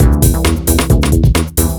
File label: 2 Step 133 MIX, House